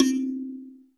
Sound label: SANZA, TONAL PERCS; Crypton - DrumBase5000